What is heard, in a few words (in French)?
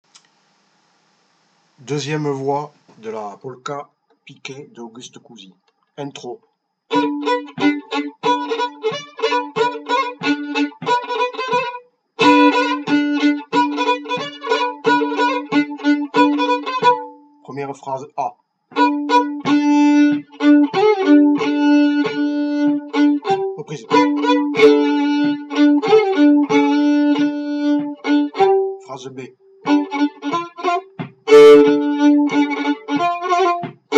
2ième voix Polka piquée; A Cousi